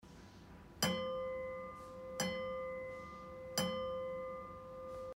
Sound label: Harmony Flower C5 Red